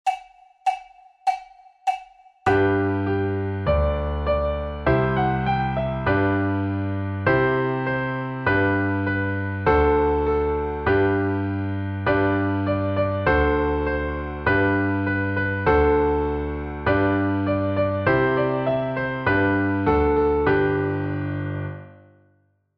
PIANO PLAYBACK; Baa Baa Black Sheep

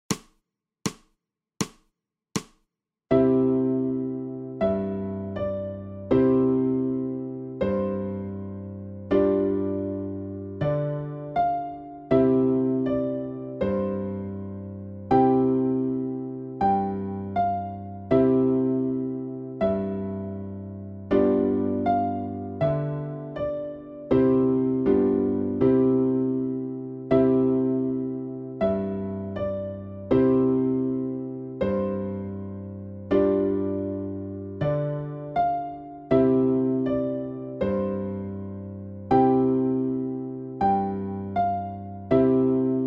SOLO ALTO; Go Tell Aunt Rhodie

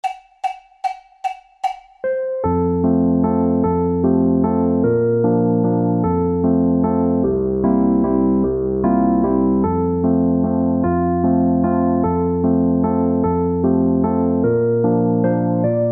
PIANO PLAYBACK

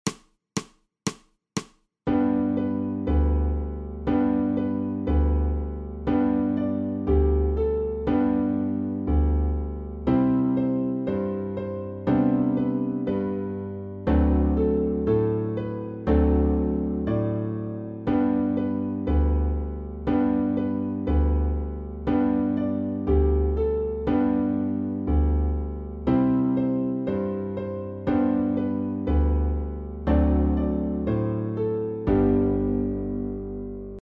PLAYBACK SOPRANO

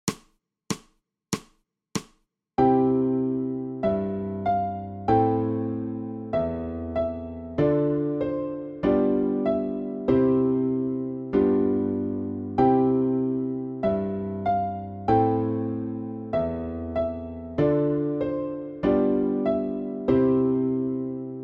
SOLO ALTO; Hansel e Gretel

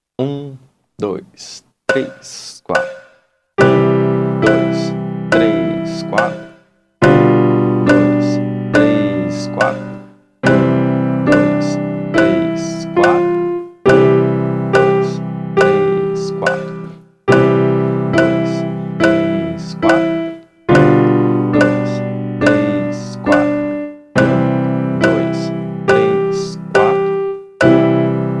May Song 70 BPM

